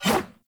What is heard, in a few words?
Sword Whoosh